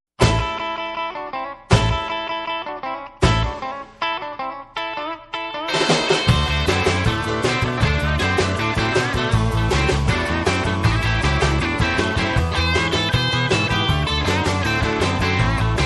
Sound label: 50s Rock and Roll